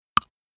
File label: scale